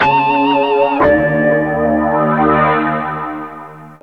Low harmonic; misc; Sonic Foundry (Sony Creative Software); Ugly Remnants VOL-2

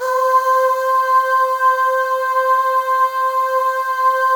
Voices, FEM AHS; Spectrasonics; FEM.1 AHS

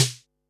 snaredrum, Pearl SC-40

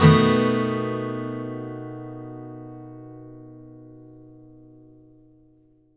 ACCGTR 7TH; Country

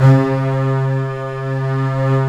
STR STRBS, MIDI Section; String Master Series; Combos 2

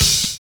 DOUBLE BD; Univers Sons

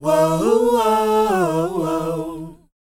WHOA E, Vocal R&B & Dance; ILIO; Vocal Planet

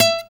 PULL, Acoustic; Sound & Vision; Gigapack I CD 2; GUI ACOUST.; GUI Acoustic 1

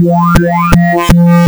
PPG wavetables; waveedit256

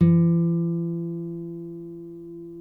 NYL NV F#, nylon no vib guitars; Spectrasonics; Hans Zimmer Guitars VOL-1

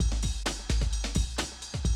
130, Classic DrumLoops

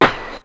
Snare, Ensoniq ASR-X Pro

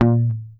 A#1 GTR TRIK; Univers Sons; Jazzistic

